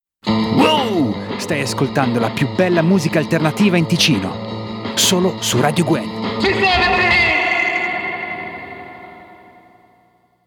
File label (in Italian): Jingle Migliore musica alternativa